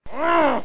grunt annoyed

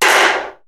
can hit; pyntnclick

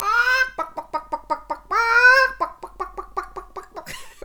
sell chicken; rinkhals

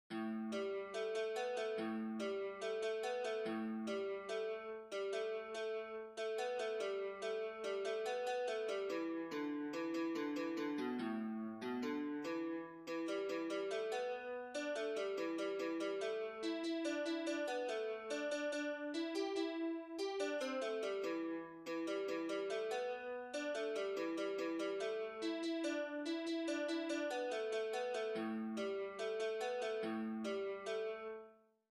ambient japanese music

